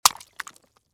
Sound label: Casting the fishing rod into the water